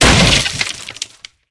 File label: wood destroy